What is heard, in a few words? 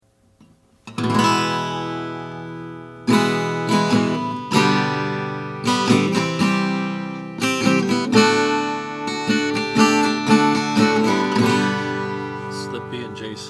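regal serenader sunburst, Guitars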